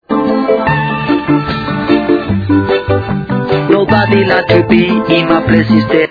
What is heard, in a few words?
bongobong